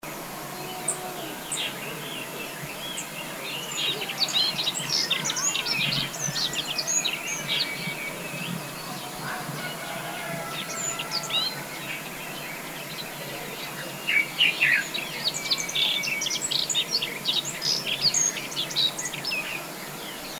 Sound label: Black Faced Bunting Song; bruant masque emberiza spodocephala ssp indetermine; Guangxi Shanli